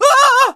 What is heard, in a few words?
Volume check